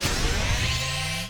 near win win

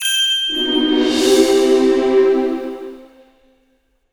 intro Splash Continue Button

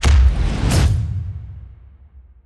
buy first press; netent

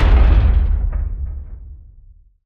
button Continue; netent

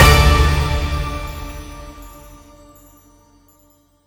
super mega win end; netent

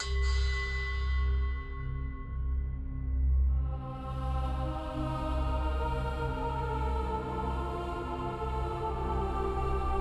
Music Main game Calm; netent

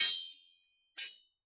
button Continue; netent

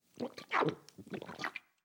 beer; easter egg; netent

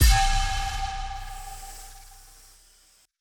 mystery Sym Land; netent

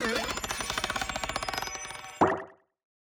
Free Fall Gate; netent

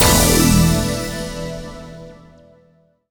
win snd; netent

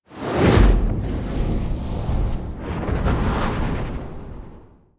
multiplier Wheel Intro; netent

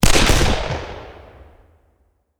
large Win; netent

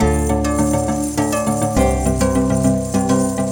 Win Big; netent

